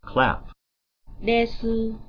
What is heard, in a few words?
clap